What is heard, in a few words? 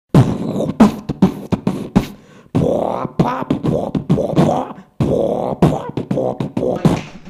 beatbox